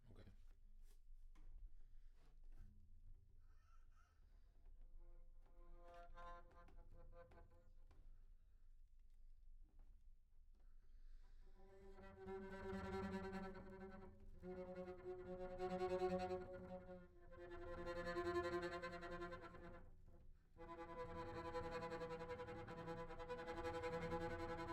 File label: Bass